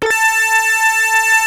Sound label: PPG A4, synthesizer; Hollywood Edge; Giorgio Moroder Rare Synthesizer Collection; PPG.2.3